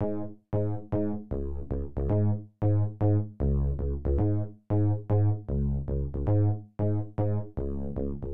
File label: Main Melody Synth D50